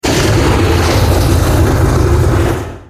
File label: Flamer Shot; FoS weapon sounds